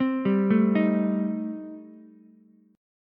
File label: A Minor 7th Flat 5th A Ukulele, chord; Am7b5 arpeggiated